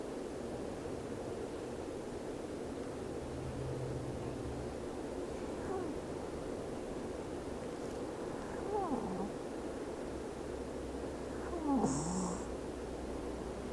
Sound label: whimper